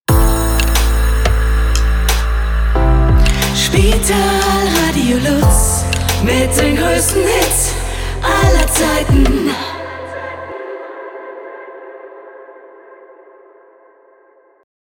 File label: 90 BPM JINGLE LIGHT; Spitalradio LuZ